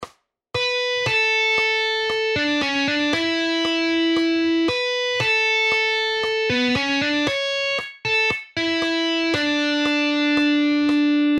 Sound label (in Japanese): リード